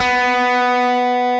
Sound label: DIST B3, DIST GUIT